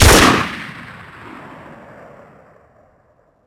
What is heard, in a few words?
m1carbine shoot, weapons; svencoop; ww2projekt